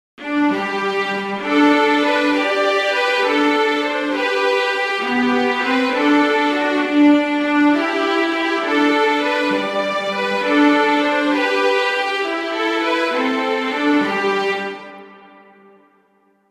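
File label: I Saw Three Ships, Christmas flute quartet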